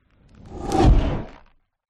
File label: fantasy magic spell zap whoosh cold ice crack impact